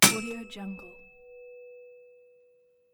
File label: metal hit